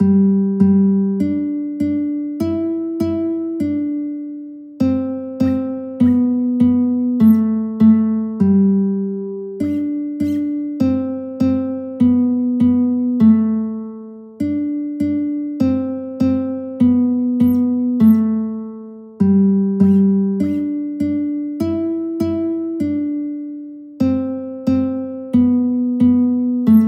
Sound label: guitar; twinkle twinkle little star